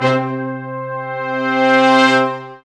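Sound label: Sections, Brass Large Ensemble sfz; Roland LCDP06 Brass Sections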